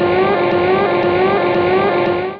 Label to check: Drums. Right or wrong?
Wrong